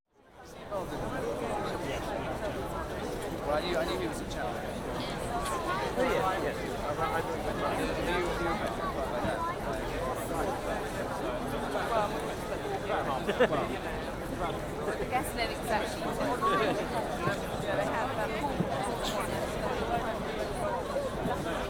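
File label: Large Crowd, Exterior Atmosphere Ambience With People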